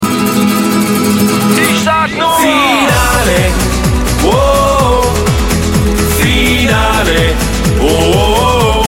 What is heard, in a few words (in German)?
Jingels